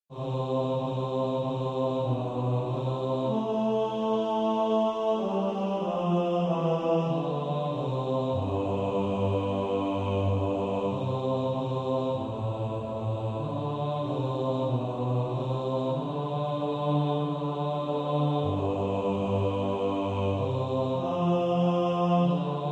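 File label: bass, practice music; Rejoice the Lord is king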